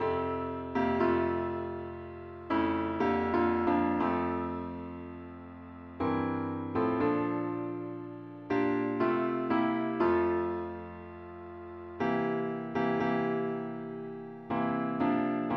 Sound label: parts only key f